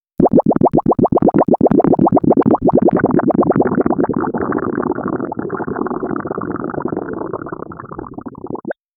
a deep resonating gurgle, organic

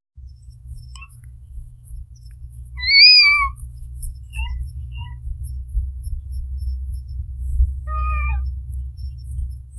adorable kitten sounds, feline

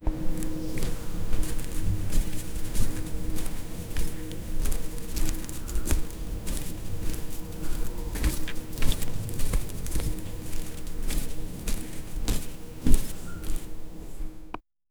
soft step barefoot on a

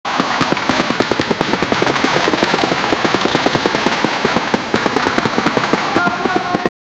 sound of soldiers marching

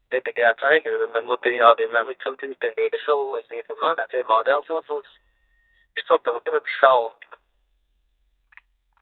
answering a call, phone

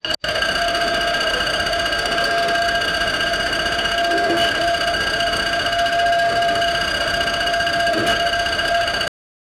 detailed industrial compr; mechanical clicks

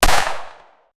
glock 17 gun shot; firearm

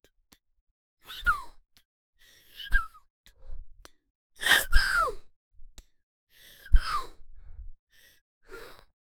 woman wheezing and squish, breathing

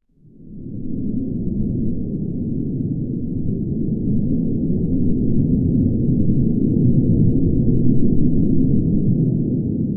soft wind and faint crack; particles

